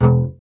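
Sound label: string contrabass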